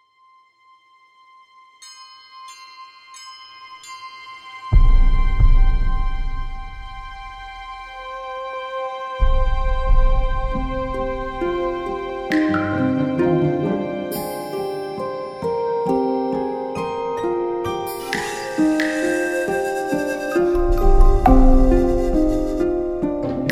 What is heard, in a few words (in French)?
le signal